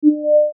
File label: Beep Tone; Multimedia Web Interactive; Technology; Beeps; Blastwave